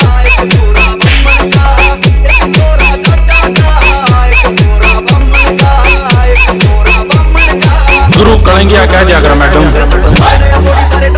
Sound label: Blast EDM ReMix